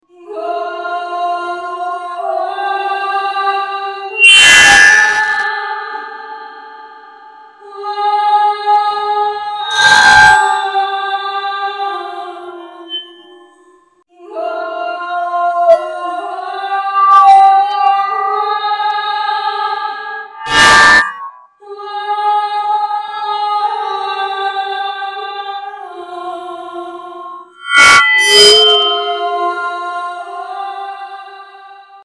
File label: Glitches; TEGELER RAUMMASCHINE